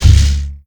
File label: Object Creation